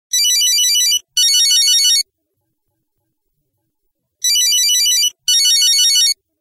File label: Nokia 1110 Old Ringtone